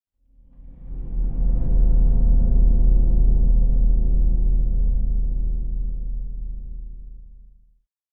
Bell Ring Big Ominous Low Tone; Hollywood Edge; sound designer's toolkit v2

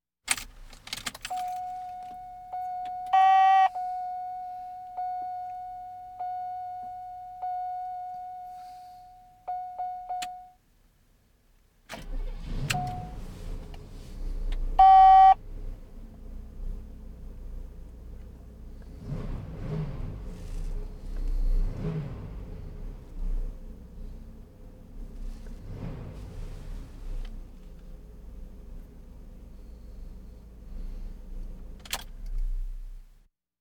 Automobiles various

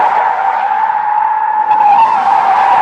tire squeak loop; Blind Drive

